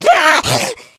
pain; Left 4 Dead 2; Source Engine; video games; jockey

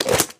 pda holster; source engine; tf

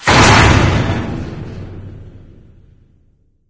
gate fall; ambient; source engine; ep2; outland

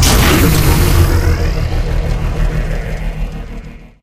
platform start

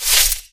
cloth foley; Portal 2; Source Engine; video games; coop

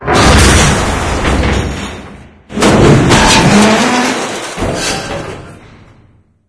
van ride; video games; source engine; episodic; intro

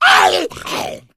hunter pounce miss, voice; player; source engine; left 4 dead 2

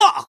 pain sharp; scout; source engine; tf

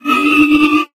robot synth; Source Engine; Portal 2; robot parts

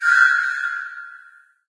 amb rand abstract hi; source engine; portal2

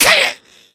become enraged, infected; Left 4 Dead 2; Source Engine

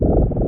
dog idle, npc; Source Engine; video game

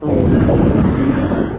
attack muffled double, antlion; npc; source engine; episodic

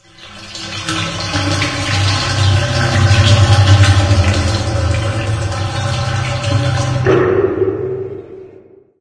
ambient water drain; video games; nature; source engine; portal2

